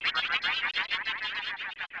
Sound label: laugh; toadies; smw2